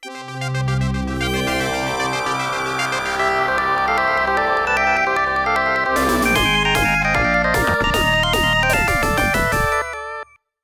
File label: Game Start; Galaga '88